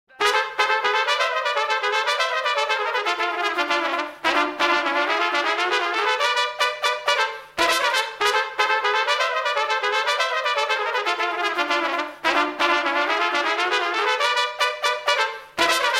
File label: Cool Trumpet